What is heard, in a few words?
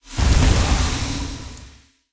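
Pillar red death, monster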